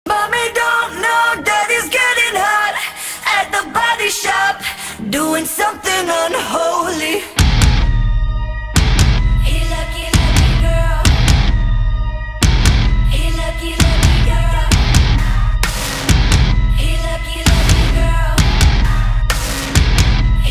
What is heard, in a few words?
rock version